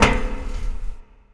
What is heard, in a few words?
foot metal; Earth and Beyond; EA Games